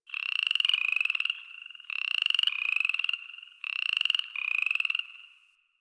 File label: Night Zone Tree Critters, Ambience Plains; Warframe; Eidolon Plains; One Offs